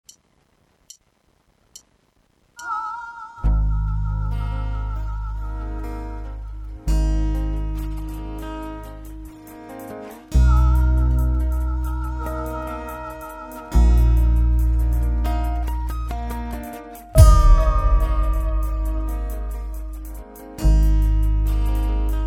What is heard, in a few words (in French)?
Ambience d'automne